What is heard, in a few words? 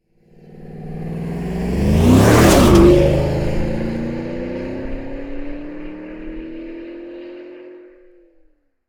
Porsche Cayenne I 4.8 V8 exterior drive away fast roar; stereo; Soundholder